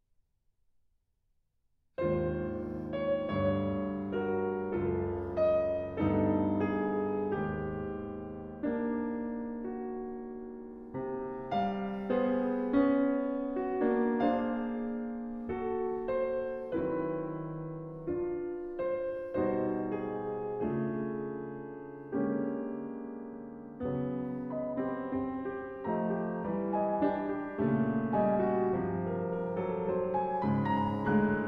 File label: Piano Suite, Largo